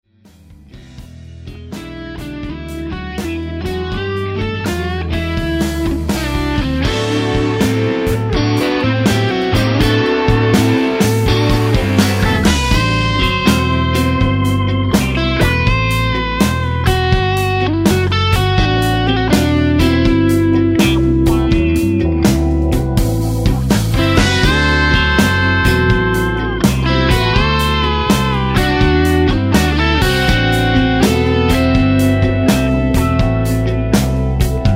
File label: arpeggio hi; sleight of hand